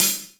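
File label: hi-hat closed Rock, drumkits; hydrogen; GMkit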